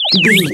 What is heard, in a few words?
retro bird critter call squawk